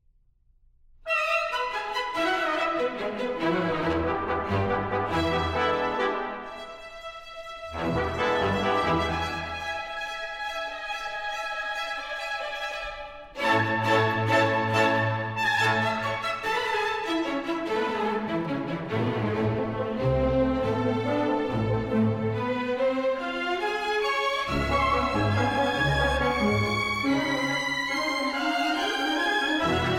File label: symphony, upbeat